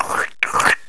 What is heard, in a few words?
lick